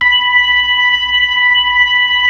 B-3, Club

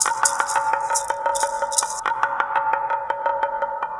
Shaker Breaker; Sonic Foundry (Sony Creative Software); Myths of Technology; Acoustic Beats

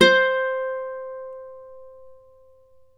Celtic Harp, Plucked Strings

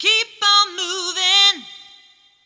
Sing Voice Moving

